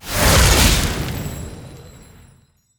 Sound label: multiplier add; netent